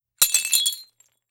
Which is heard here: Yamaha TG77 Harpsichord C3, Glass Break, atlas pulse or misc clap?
Glass Break